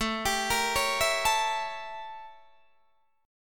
A 7th Flat 9th Guitar Open D

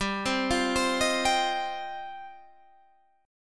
G 7th Suspended 4th Sharp 5th, Guitar Standard; G7sus4#5 arpeggiated

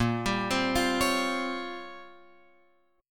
Bb Minor 6th Add 9th Bb down Guitar Open F; arpeggiated; JGuitar

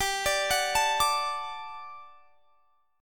G Major 7th Suspended 2nd G down; GM7sus2; arpeggiated

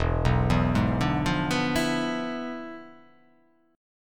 E 7th Flat 9th, down

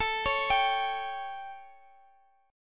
G Suspended 2nd Flat 5th A, chord

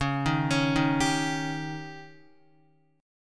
Db Suspended 2nd Flat 5th Db down Guitar; 4F,4A,5C,5G,5C,6E; arpeggiated

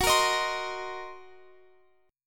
Gb 6th Flat 5th Gb down Guitar Open F; strummed; Gb6b5; JGuitar

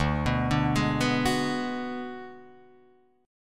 D 6th Flat 5th D down Guitar Drop D, chord

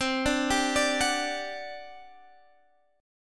G Major 7th Suspended 4th, C

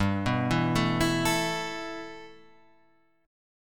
A 7th Suspended 2nd, G

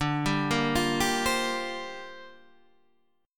C 7th Suspended 2nd Suspended 4th D

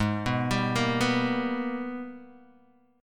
G sharp Minor Major 9th, Guitar, Open D; 6,2,5,4,2,x

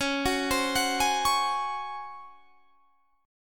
Db Major 7th Suspended 4th Db down; arpeggiated

